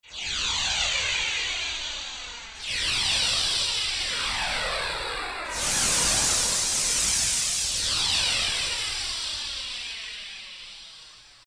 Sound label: Jets, Generalmusic Equinox